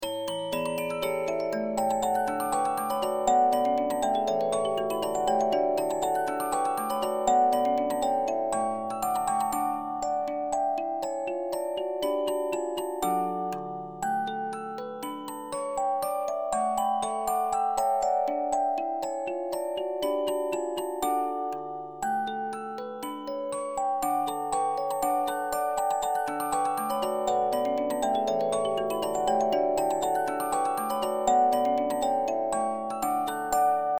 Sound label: Polka Simulation, 72-Note Movements, music box; Dallas Polka